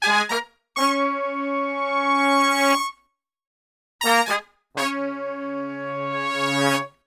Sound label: SOUL DB, Brass, SOUL LICK; Best Service; Brass Super Section